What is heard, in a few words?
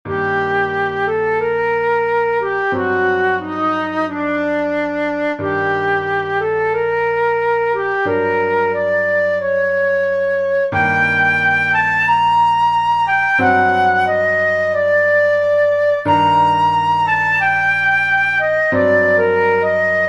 tense